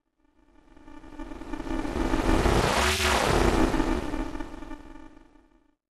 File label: SPACESHIP FLYBY